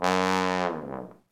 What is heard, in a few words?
TROM LNG FALL